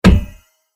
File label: hammer hit; Crystal Furnace